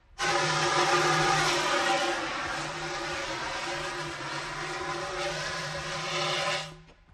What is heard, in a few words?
Air And Steam: Chemical Fire Extinguisher Blasts